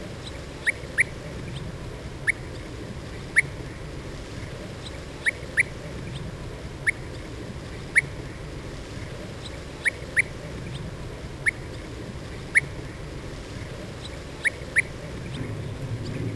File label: European Bee-eater